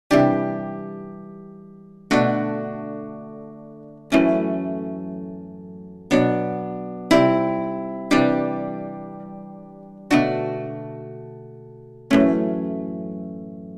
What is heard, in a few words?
amplifier electronic guitar; GORD HAGGERTY